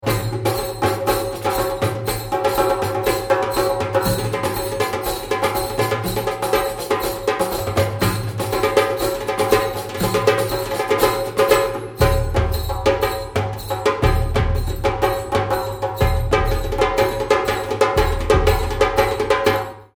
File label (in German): trommel, Musik im Mittelalter; Geschichte frei Haus